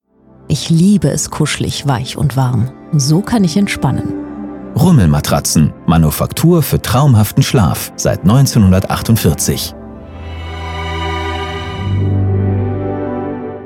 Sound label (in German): Radio Kuschel; Rummel Radio